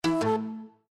Garden Hardware Remove, windows 7

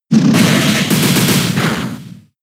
Snarl; pokemon sfx gen 6 attack moves xy oras

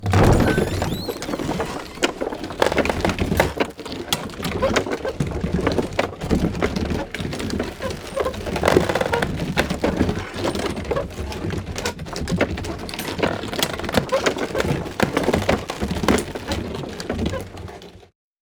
drawbridge handle

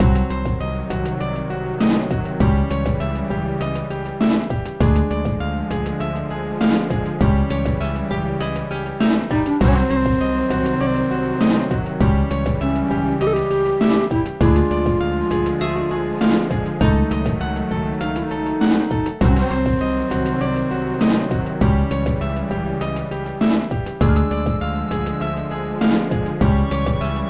BGM BOSS; Izuna Legend of the Unemployed Ninja; Nintendo DS gamerip

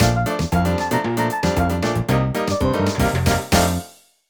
Samba

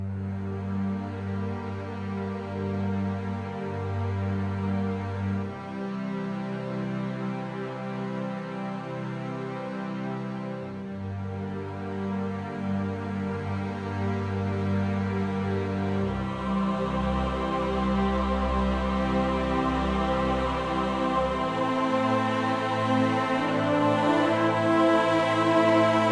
Quest Melody Loop; bakugan champions of vestroia 2020; switch gamerip

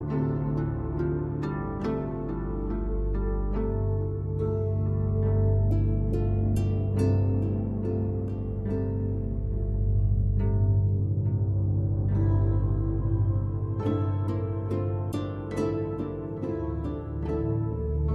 Loop Neutral

